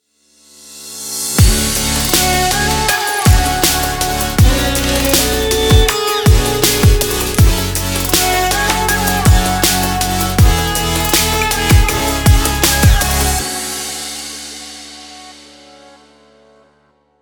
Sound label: Arabic Future Bass Kit